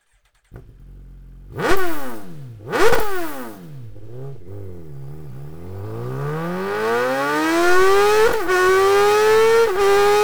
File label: evolution exhaust system suzuki gsxr1000 r; akrapovic